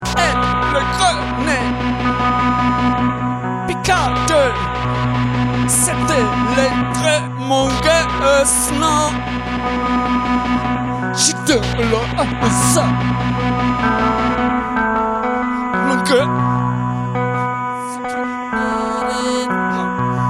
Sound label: Theremin; atelierTheremin